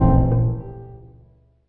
twotone lopass mystery inst; Piano and Epiano

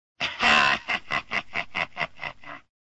male mean old laugh; MALE MISC and SPOKEN WORD

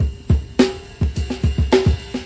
106 snare, drum loops

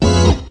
glisshit, Organs

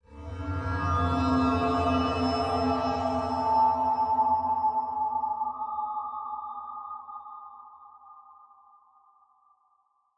chimeverb dark hollows

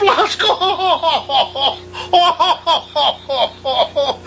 some kind of laughter, male; MALE MISC and SPOKEN WORD

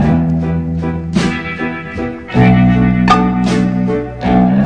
130 dusty loop; SAMPLED MUSIC LOOPS

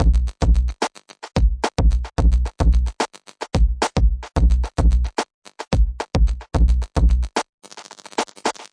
pippy eko breakbeat, 110 bpm; 101 to 110 bpm

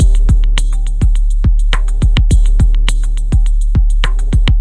bouncy boingalot, 104 bpm, drum loop; 101 to 110 bpm

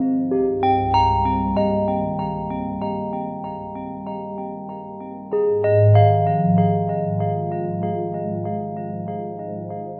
096 perfect-pretty-bell-loop, melodic loops

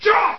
Cha

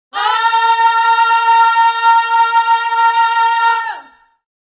african vocals male oh

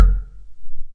bell pip

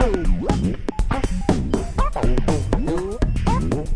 121 svank musictrip, sampled music loops

